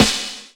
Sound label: Snare; Vengeance Samples